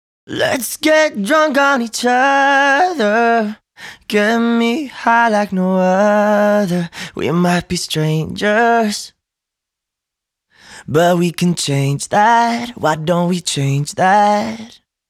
vocal wet warm

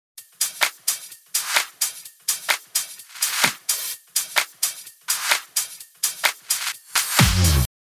Top loop

